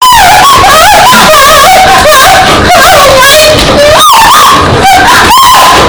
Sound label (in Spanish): gritando